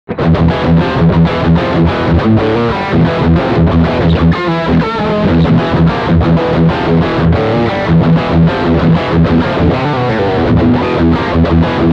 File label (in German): Metal Riff Steg Humbucker